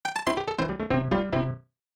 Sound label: Game Over; SMAS; SMB2